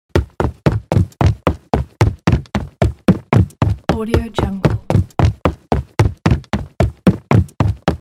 male running on wood floor

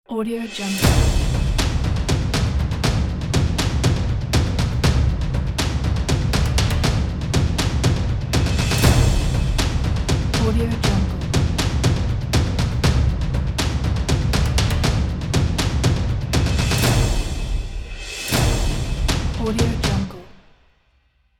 drum logo

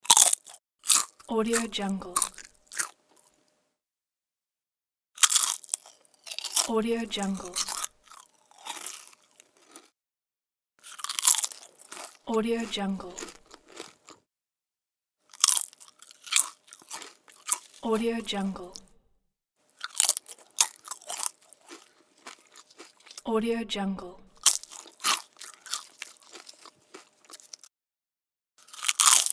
crunch of potato chips

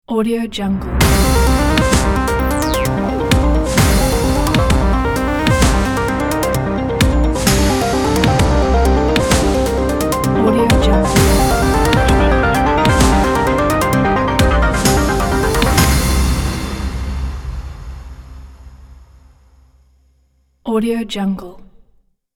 logo action

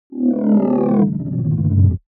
sci fi electronics device shut down; smartsoundfx

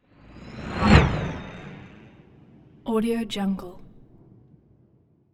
passby spaceship stealth fast; soundmorph

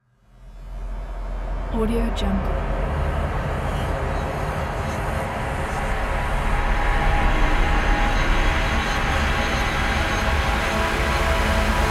horror ambience mental asylum

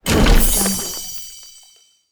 magic chest

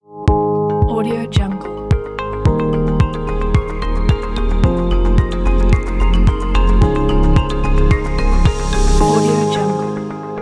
corporate technology ident

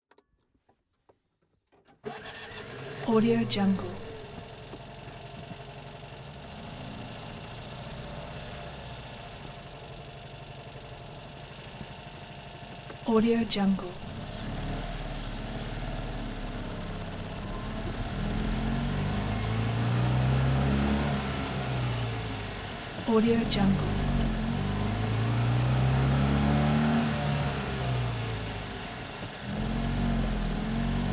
1969 fiat 500 car int

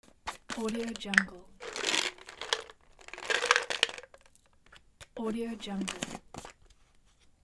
opening a bottle of pills

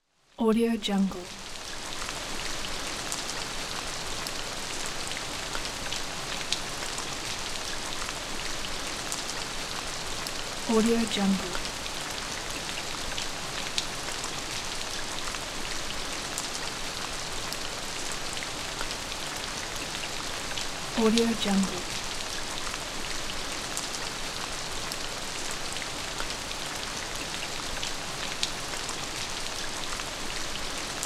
soft rain

